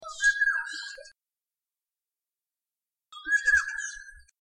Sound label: oriole call